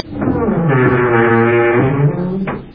skrip odinochnii priglushennii

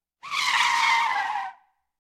skripuchee skolzhenie

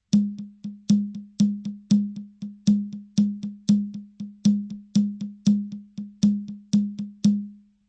barabannaya melodiya etnicheskaya

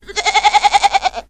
molodenkaya kozochka bleet

